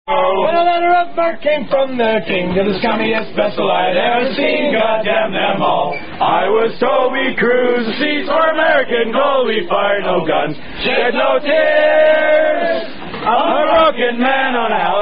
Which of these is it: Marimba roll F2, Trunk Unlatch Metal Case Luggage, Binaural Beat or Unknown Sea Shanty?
Unknown Sea Shanty